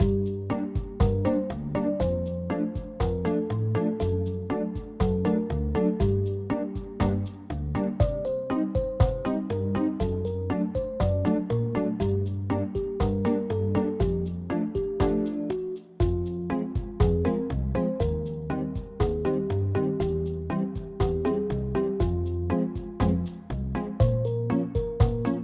Elevator; Fran Bow

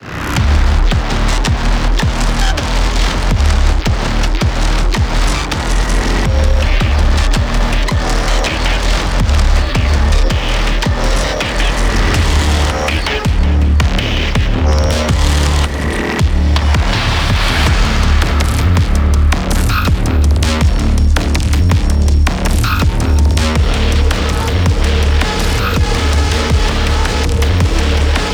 start 163bpm

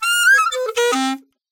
Pungi Fail; tintin reporter cigars of the pharaoh

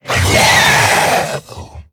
Zombie Monster Die; Undead Collection

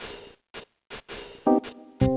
Deep Coat Intro - 110 bpm; fashion police squad beta

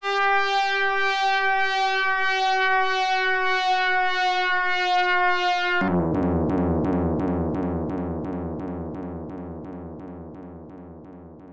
Siren; Battletoads and Double Dragon; genesis

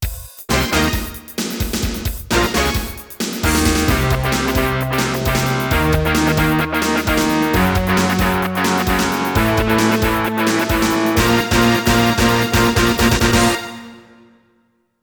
Continue, SC-55; fatal fury 2; x68000

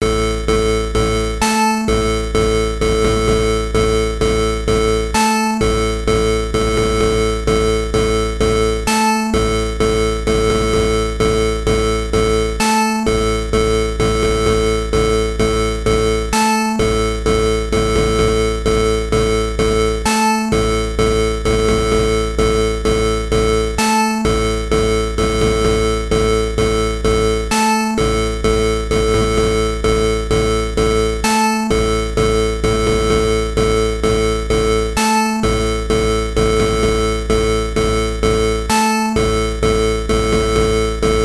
Cheer!; Sakigake Otoko Juku Shippu Ichi Gou Sei; NES

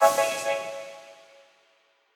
Quasar Clear B

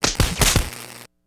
light spark; teardown; ps5 windows xbox series xs

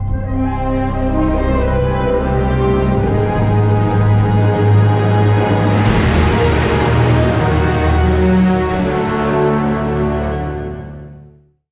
game over; Panda

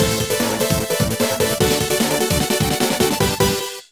Jingle - Exterminated; bomberman 2 nintendo ds

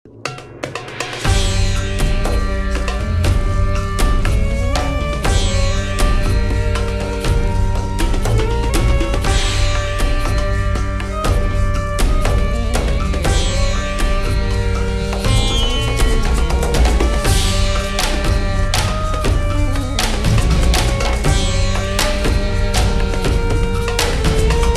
Dance Music; mata hari windows gamerip 2008

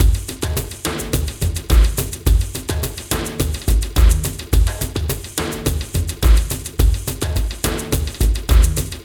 Night Attack; Beefense BeeMastered; Beefense Night Attack4

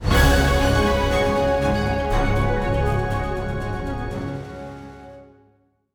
Transition To Loading Screen; Aces of the Luftwaffe Squadron

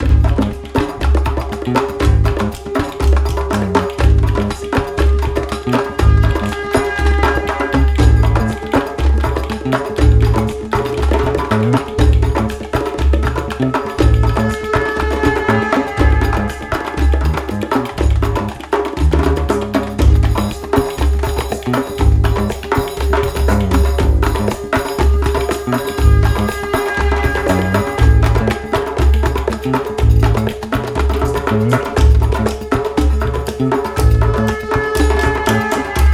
Combat loop; raji an ancient epic

